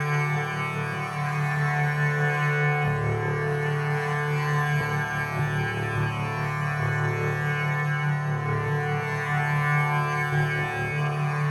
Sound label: ethno, 125, A